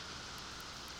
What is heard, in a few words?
Background Noise